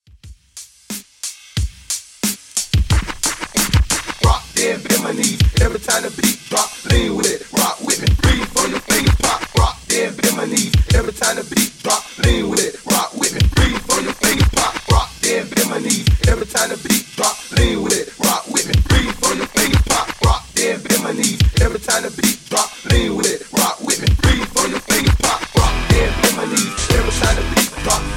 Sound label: Hype Redrum 90Bpm